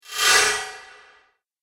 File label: Spooky Spell Whoosh; Magic Sound Effects; Role Playing Game